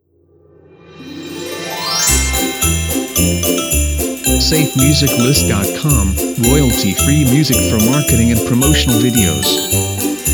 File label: Magical Christmas Video Intros; SafeMusicList